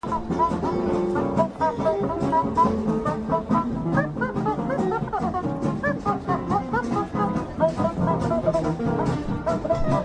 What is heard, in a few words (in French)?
jazz manouche et cuica; melun 2006